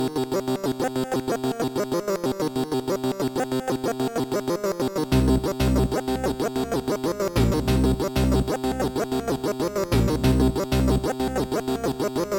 chiptune

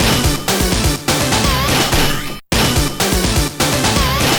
beatbox; pinball dreams; Blaizer

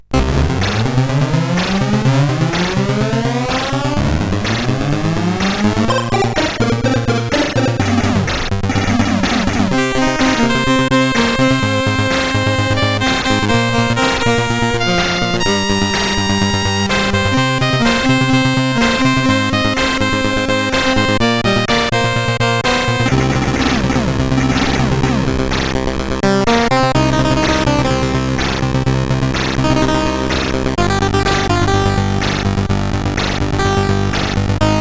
Intro, CSG8580R5; Omega Cracking Team

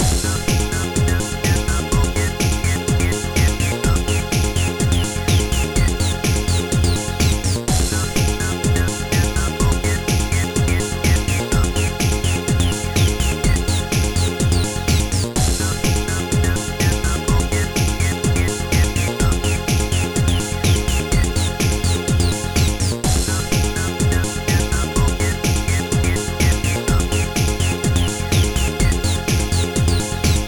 liquid techno; Trackers; Modland

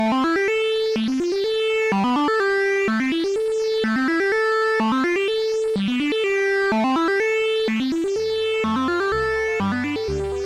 psychedelic orchestra; MusicLine

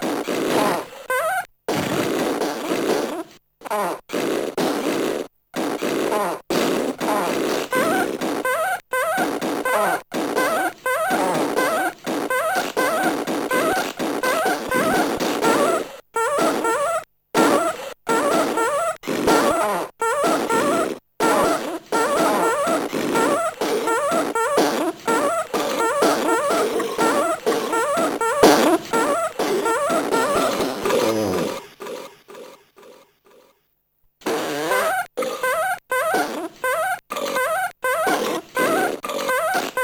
the farty burpy song; MED

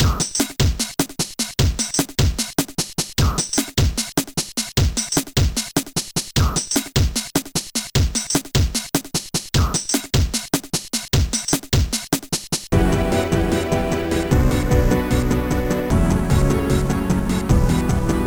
poison ambience; MED

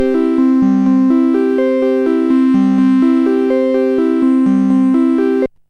soft organ flourish; MusicLine

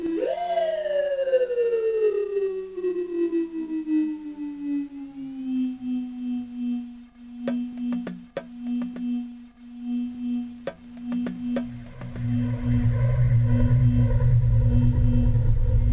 intro 8bit, DIGI Booster

